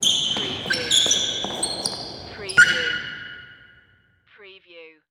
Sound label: Gymnasium Shoe Squeak